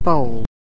BOWL, Eating In

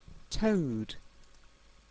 toad

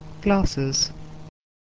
glass

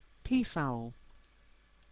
peafowl

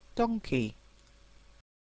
donkey

